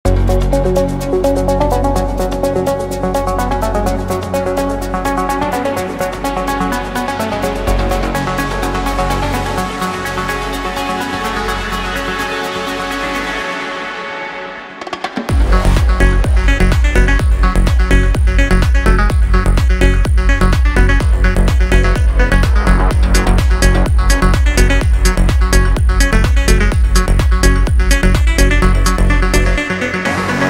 trance techno melodic progressive

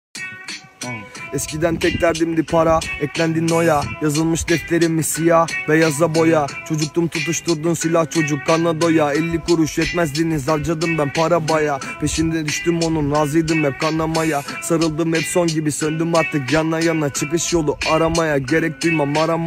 acapella freestyle serbest stil rap; rap turk

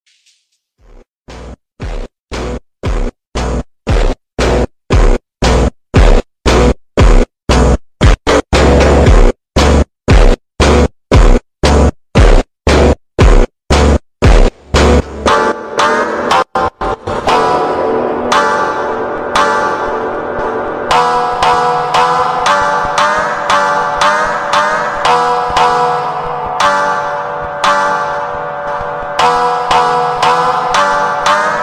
funk slowed

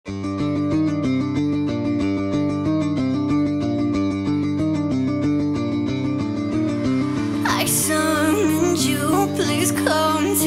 rock version

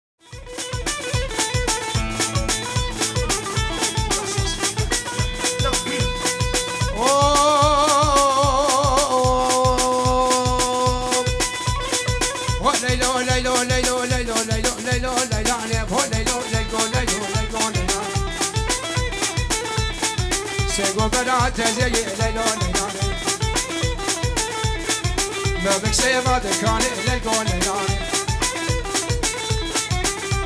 govend raks cida halay

